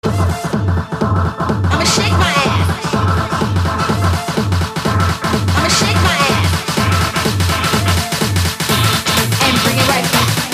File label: electro